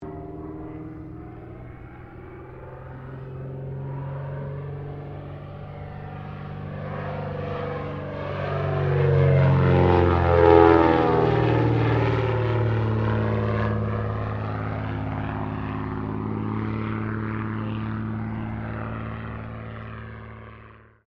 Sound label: AIRCRAFT CESSNA O-1 BIRDDOG PASS; Cessna Birddog spotter aircraft; Airplanes; Vehicles